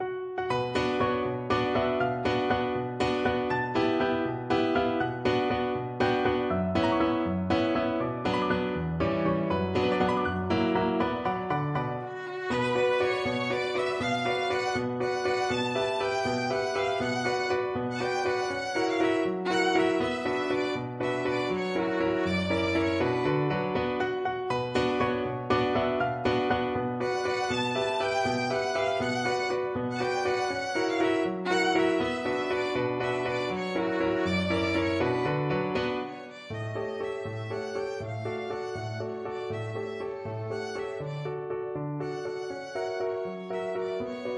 violin

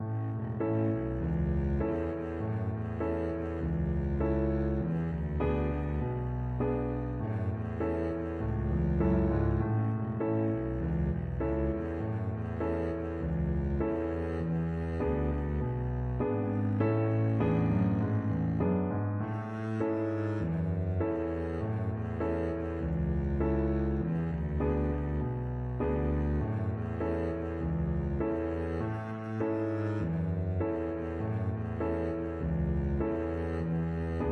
buffalo gals, double bass

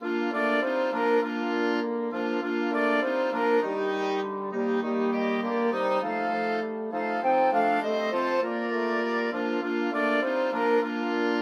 jingle bells, flexible mixed ensemble 5 players

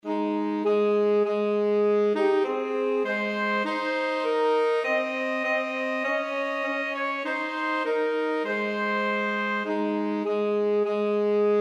ASAX TSAX; hark the herald

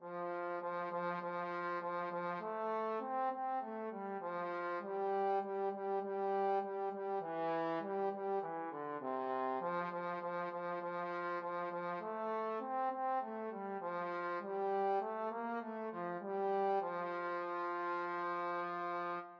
trombone

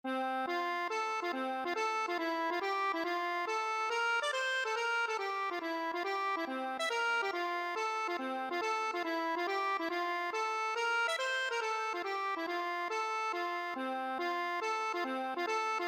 hornpipe, accordion; larry lynch

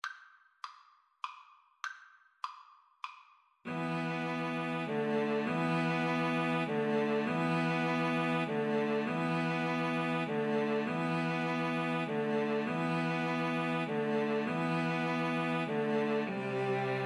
violin cello duet, Violin-Cello Duet

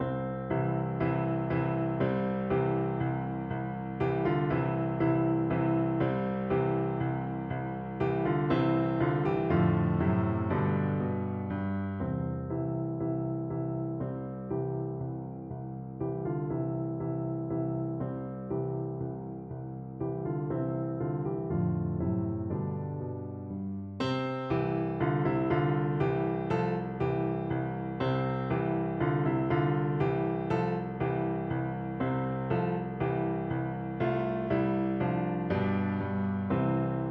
vivaldi spring piano duet

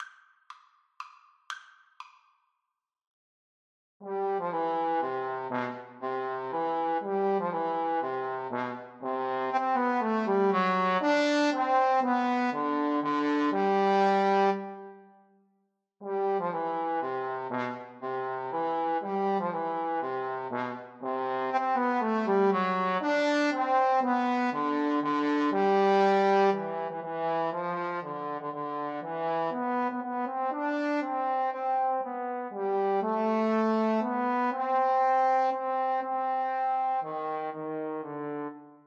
Trombone Duet

